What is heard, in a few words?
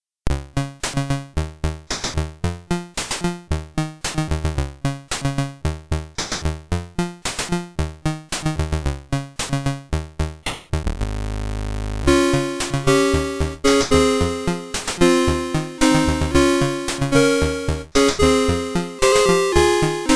theme c64